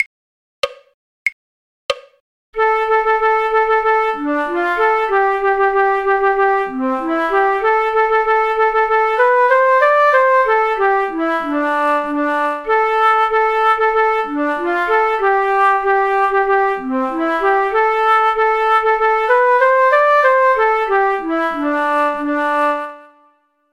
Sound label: flute; drunken sailor; folk